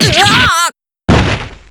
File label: superdamage; hibiki